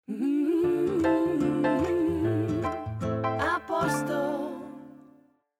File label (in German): Soundlogo